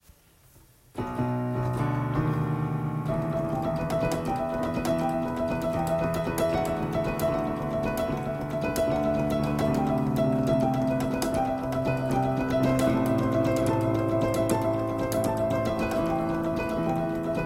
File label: Fake Piano